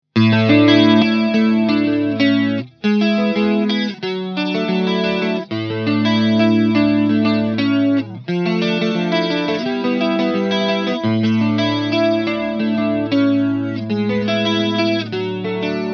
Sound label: chorus